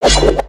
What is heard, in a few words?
Samurai Spin; Valiant; aronwk collection; ndaudio